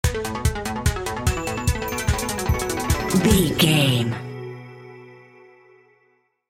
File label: video game title screen stinger